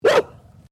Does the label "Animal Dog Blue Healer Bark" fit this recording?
Yes